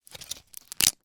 medical medicine pill remove from foil blister pack